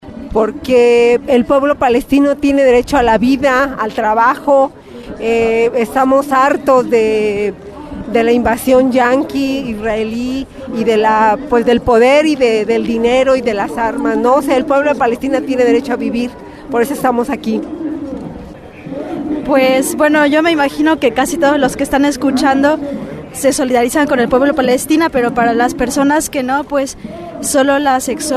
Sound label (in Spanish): marcha; palestina julio 2014